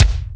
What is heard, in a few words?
Roland R-8 Mondo Kick